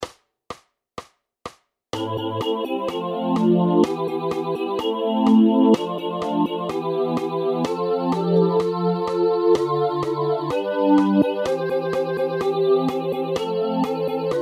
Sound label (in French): Gloria couplet tutti; Messe de Saint Paul; couplet 3; Ordinaires de messes